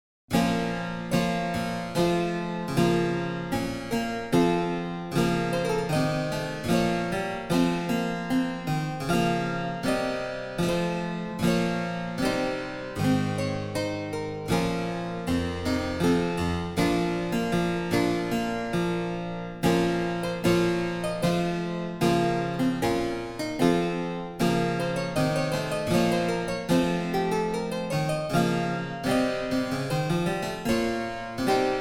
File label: German Harpsichord, Rear 8; German Harpsichord 1738; Rear 8 Demo; edition germanisches nationalmuseum